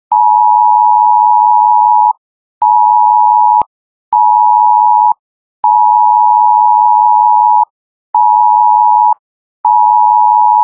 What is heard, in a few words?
CMAS tone, BlackBerry; BlackBerry OS-10